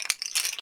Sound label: Wood Rattle; Robert Cordova